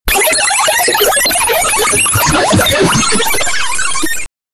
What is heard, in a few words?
wrapping velcro snap hand